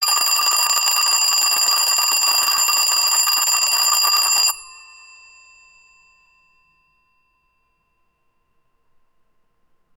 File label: an old fashioned alarm clock ringing persistently